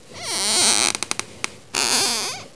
squeaky win, ambience; svencoop; blackops

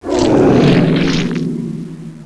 die, monsters, devourer; svencoop; AoMDC

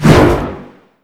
miss; tf2; slender; marauder

